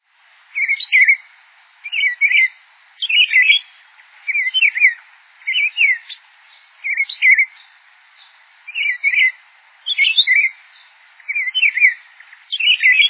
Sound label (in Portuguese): tempera-viola